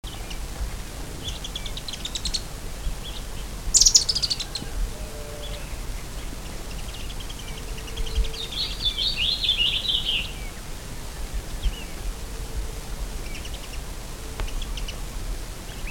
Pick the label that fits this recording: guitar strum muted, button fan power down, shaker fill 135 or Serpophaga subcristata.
Serpophaga subcristata